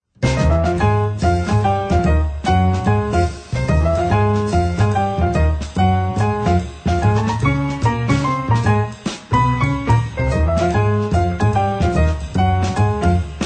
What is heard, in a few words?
Blues